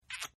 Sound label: Kiss Smooch; Kissing; Human; Partners In Rhyme